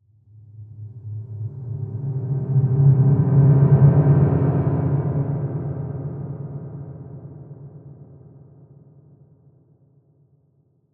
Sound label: Reverb Swell Reverse; Reverse, Whoosh, Horror, Production Element, Imaging Element, Accent, Transition; BlastWave